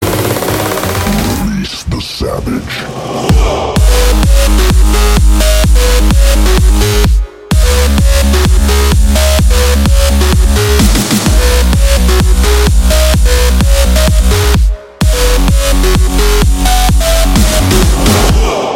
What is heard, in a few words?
Savage Ringtone